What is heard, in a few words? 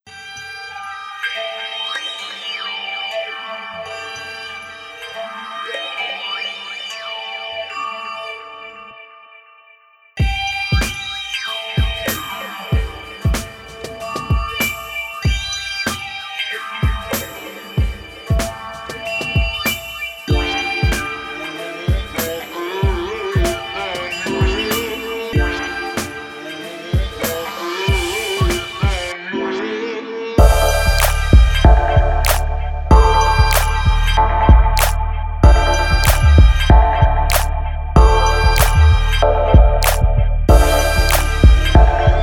japan chinese